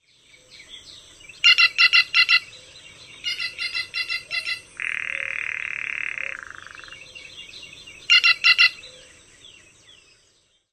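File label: Green Jay, piping calls and rattle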